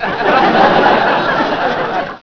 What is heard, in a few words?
big laugh; Sound effects